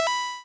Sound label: lockon; action RPG game